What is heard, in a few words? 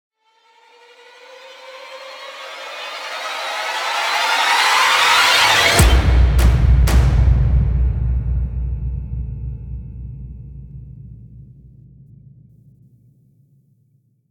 Epic Damage Rise; Gothic Storm Music; Brain Warp (Sound Design Trailer Tools) 2011